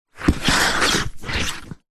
foley footstep boot movement shuffle wet ground puddles